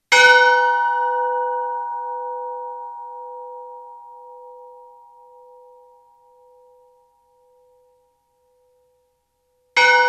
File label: BELL; SOBRAON